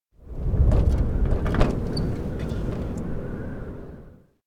window rattle; anemoi

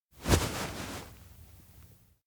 futon surprise; anemoi

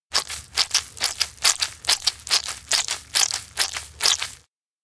milk cow; anemoi

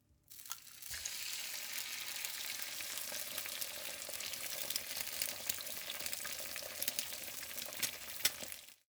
omelete; anemoi

